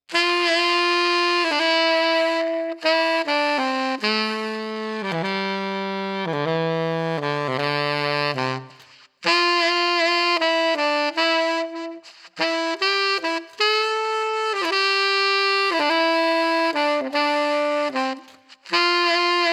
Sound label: Rabbit Sax; ConsciousNest; LPSaxTrax